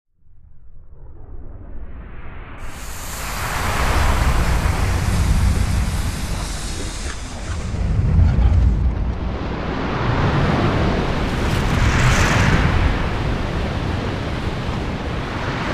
Avalanche; snow

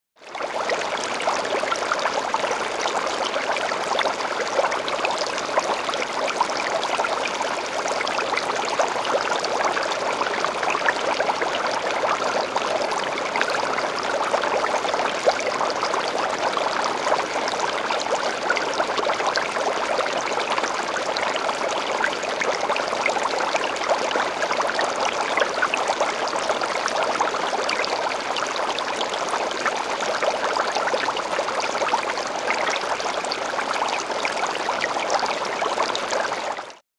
Flowing stream; river

